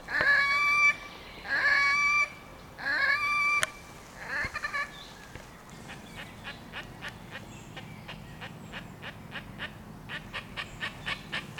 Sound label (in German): Rothalstaucher; Wellesweiher bei Kosbach; Barbara Goldmann